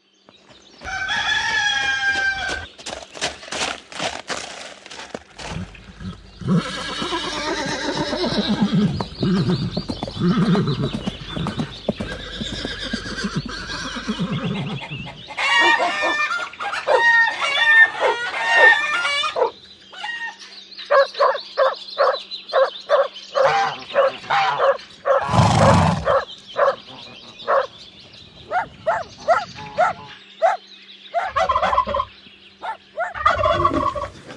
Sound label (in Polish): Nagrania odgłosów wiejskich zwierząt